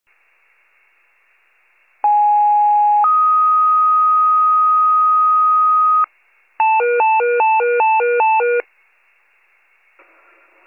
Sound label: fire, pager alert